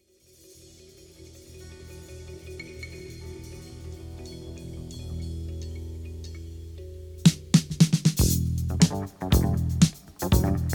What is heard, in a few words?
Kalimba Song